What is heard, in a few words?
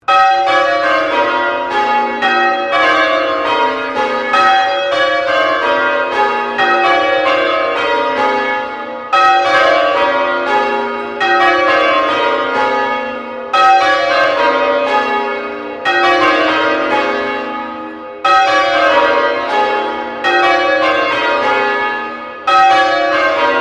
Bell St Pauls Cathedral Melbourne Bells Sequence; The bells of St Pauls in Melbourne; Musical